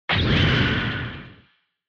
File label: Retro Anime Robot Mid